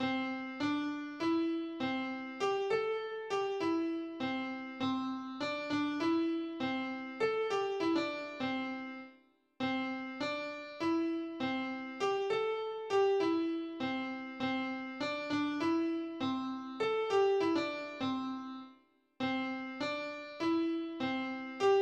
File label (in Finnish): Pentatoninen salaatti